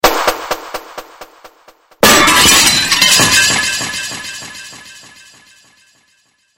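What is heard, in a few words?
glass breaking; rnb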